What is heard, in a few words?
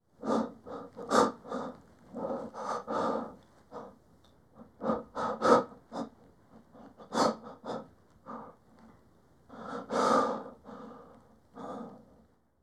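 anxious male breathing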